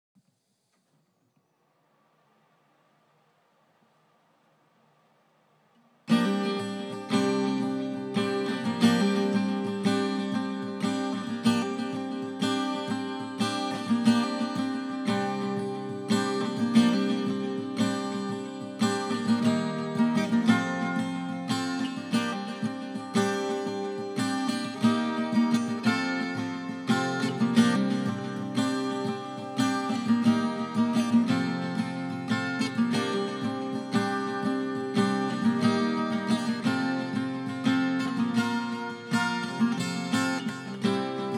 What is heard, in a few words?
Guitar